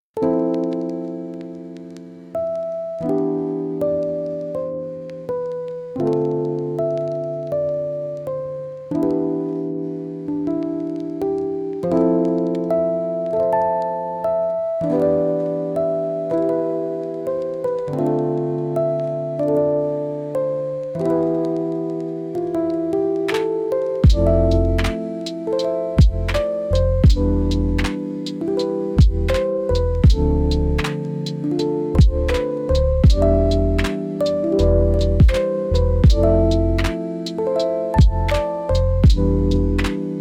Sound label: rainy lofi city lofi music